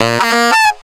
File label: brass riffs & licks; Total Drum&Bass